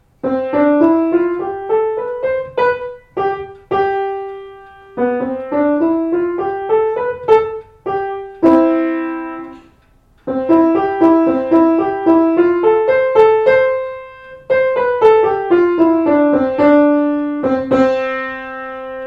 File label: scales and arpeggios